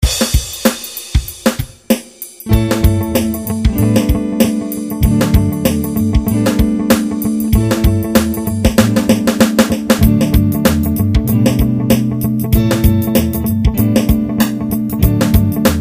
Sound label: Funky